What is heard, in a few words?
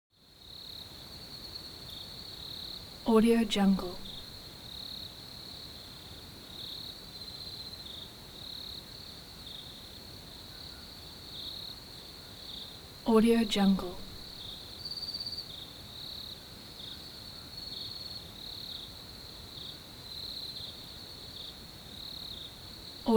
atmo bush water hole night calm; smartsoundfx